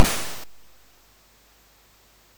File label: Paddle Mania, CSG8580R5